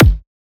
Dirty Kick

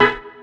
DR. LOOP; X-Static Goldmine 3; CHORDS

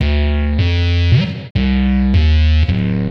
Bass, Futurist Drum & Bass

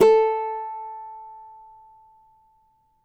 CAVAQUINHO A, Guitars; Spectrasonics; Hans Zimmer Guitars VOL-2

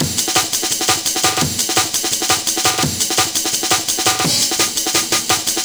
Kickin Break, Drum & Bass, Beats & Breaks

